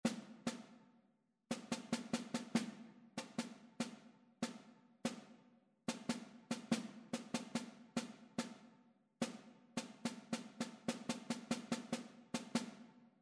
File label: rhythmic error detection